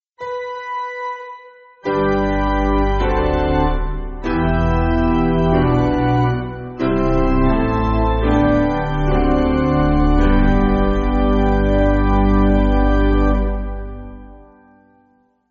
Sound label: Three Fold Amen, Danish, Piano